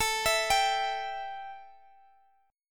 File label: A 5th 7th A, guitar chord; A57 Chord {X X X 14 17 15} arpeggiated